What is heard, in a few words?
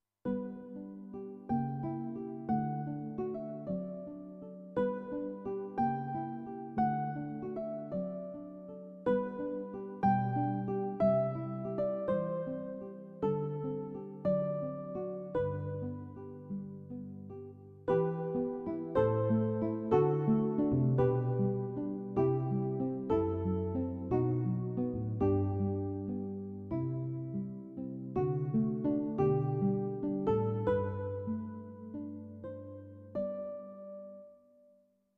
Nylon El Piano